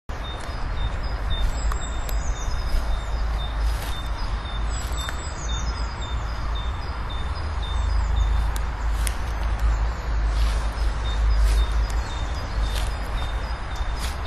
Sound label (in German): Meise